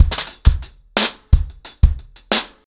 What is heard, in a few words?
90BPM, acoustic drums, drumloops